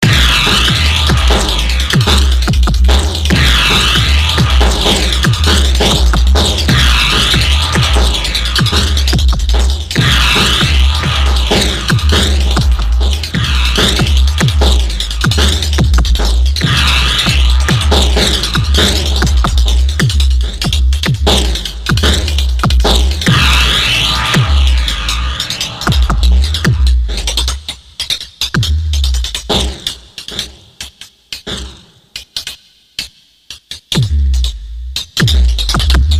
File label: Note Rndm Beat; Note Randomizer